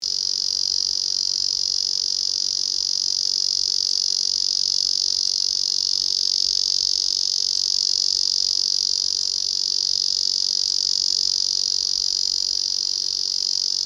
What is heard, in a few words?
cicada ezozemi